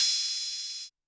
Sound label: HH R&B Oh